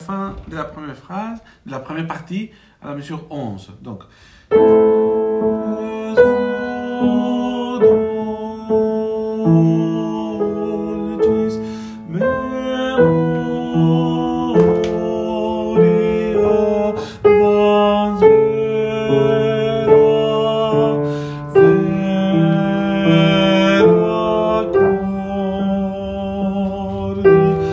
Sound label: Jesu dulcis memoria soprano; mesures 1-11; Victoria